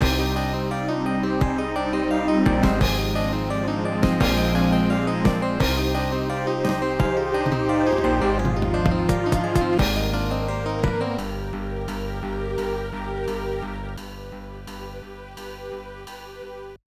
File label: Boss Defeat Interlude; Dark Sniper Falls!; Mantix